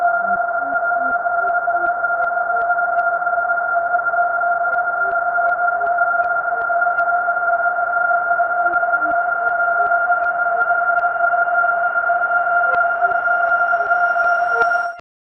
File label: Heavenly Riser; Reverse